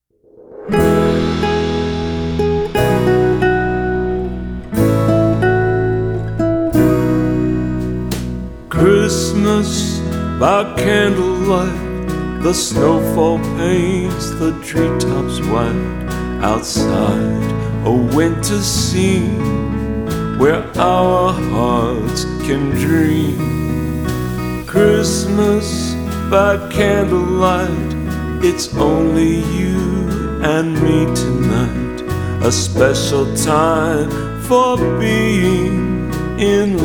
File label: acoustic mix